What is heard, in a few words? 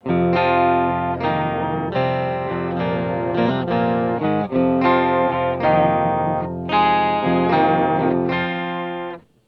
Poly Glitch; Mooer Harmony X2